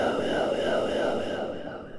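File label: Music dpad Black Hole 120; from dust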